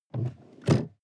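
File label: sports car interior seat unlock; verkehr transport; autos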